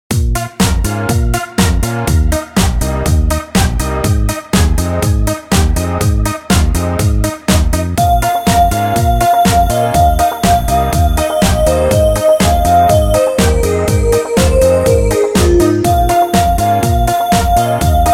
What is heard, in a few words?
brass minus